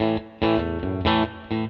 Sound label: Open Chord Riff, Rock Guitars; ACID Music; Tele Dreams